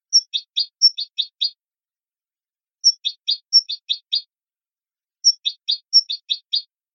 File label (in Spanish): adornos